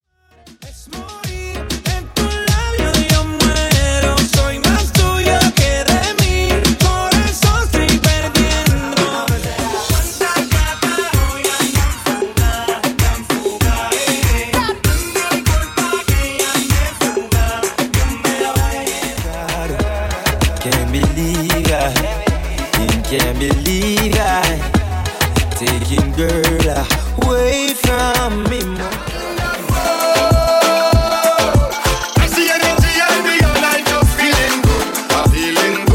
Party Reggaeton and Moombahton 97Bpm; Mixes

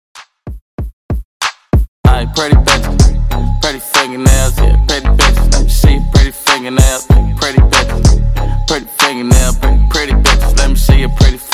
Dj Intro Outro 100 Bpm

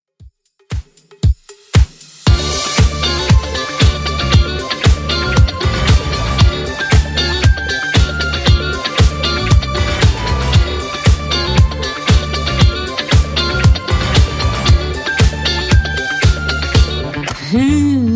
70s Redrum 116bpm

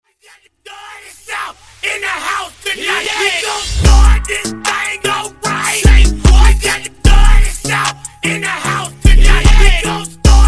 Acapella Intro Outro Breakz; 75Bpm

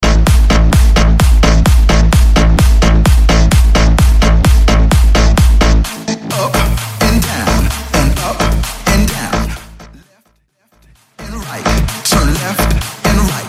Melbourne Bounce Mainstream Hype; Vegas Edit

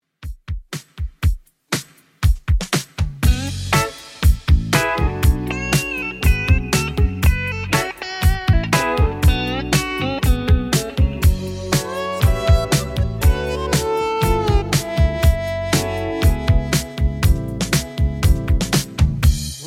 80s Redrum 120bpm CLEAN

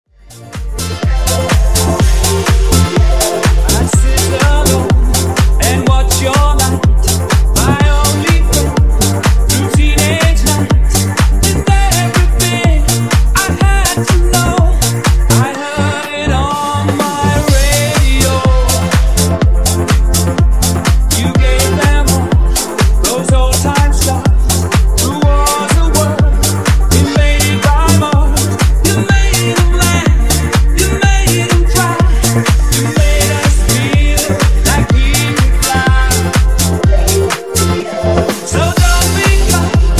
Disko Funk Remix 124Bpm

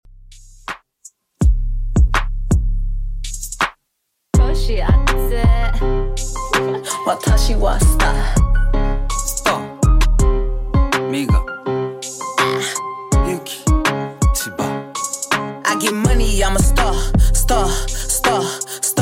Top40 Redrum 82 bpm DIRTY

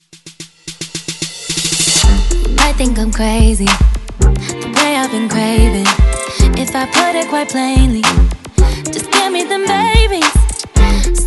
Top 40 Redrum

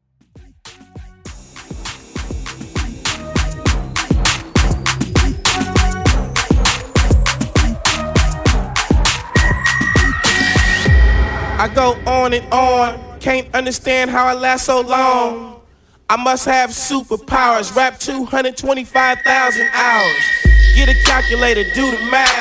Epic Intro Edit

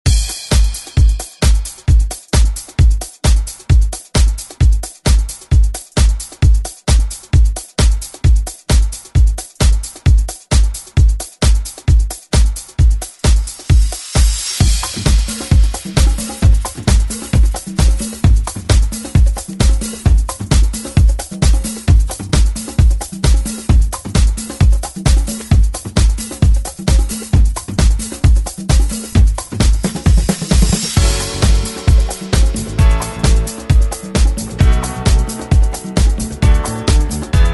70s Instrumental ReDrum; DJ Mhark

